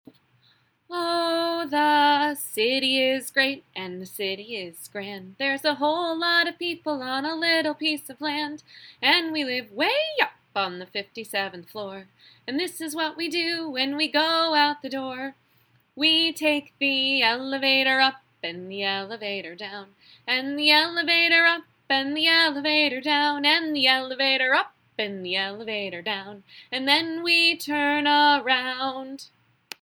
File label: Elevator Song